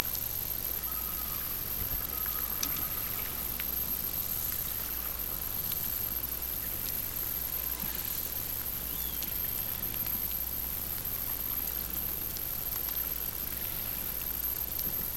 Hydrophones, orcasound

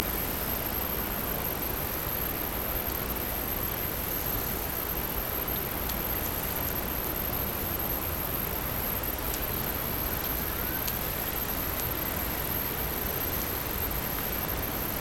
Hydrophones, orcasound

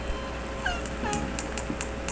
call S7 J version; Sea Sounds

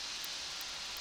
Background Noise

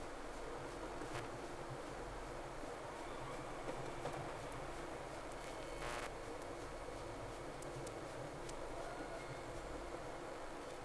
Whales; SeaSnake

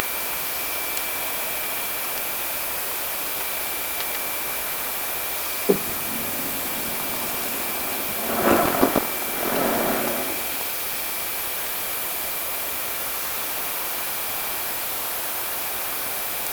Orca sound Night Passby

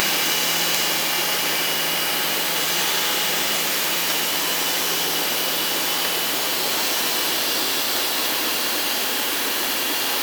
Orca sound Night Passby

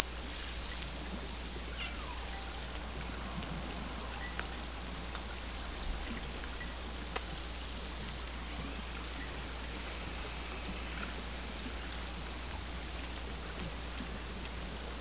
Hydrophones, orcasound

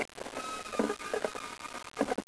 Sea Sounds, Calls

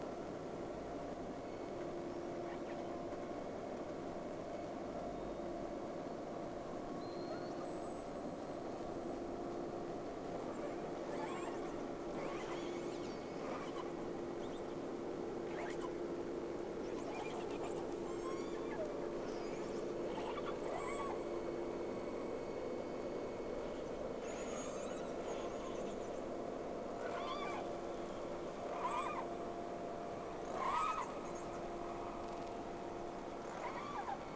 Whales; SeaSnake

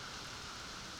Background Noise

